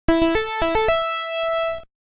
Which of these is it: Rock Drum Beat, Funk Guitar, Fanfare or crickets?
Fanfare